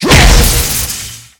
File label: Rage Dragon kick Att; Ragefighter